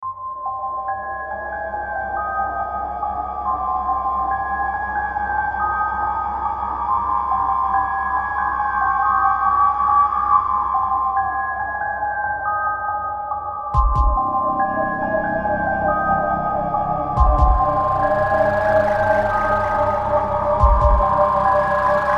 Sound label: menu music; Alien Shooter 2